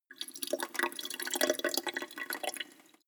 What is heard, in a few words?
sink drain; haus garten; badezimmer